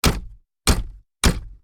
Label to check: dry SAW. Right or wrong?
Wrong